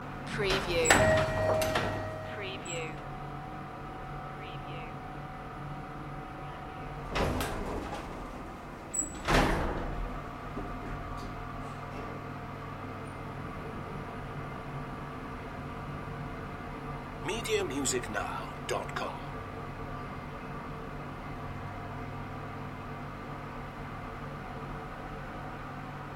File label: elevator ride loop